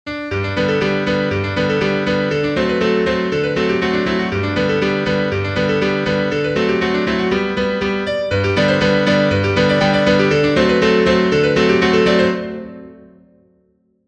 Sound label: Circassian circle PIANO